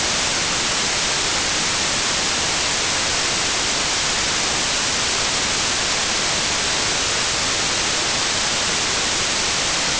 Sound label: white noise